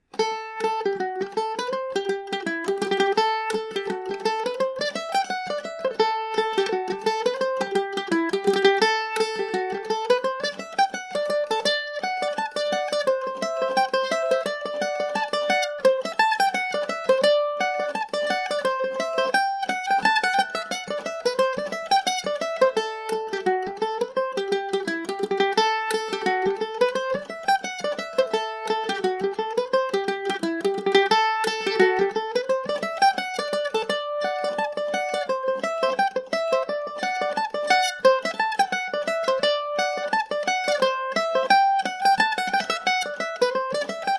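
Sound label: D mixolydian, Irish mandolin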